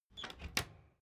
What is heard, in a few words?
door flap loft unlock